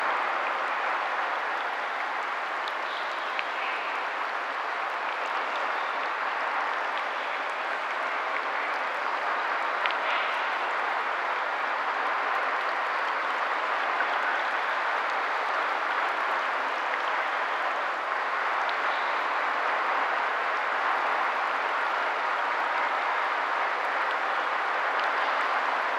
ambience rain basement; Aragami

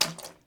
Wet Footstep; Backrooms 2D

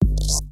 Bomb

